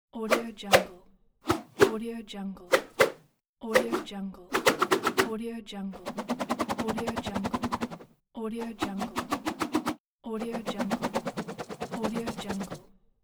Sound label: swish fast multiple whip; bestsfx